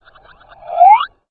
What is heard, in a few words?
alert, houndeye